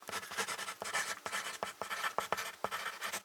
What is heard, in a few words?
Pencil Write On Paper; SFX Kit; Foley - Misc